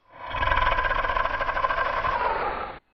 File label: Dragon Growl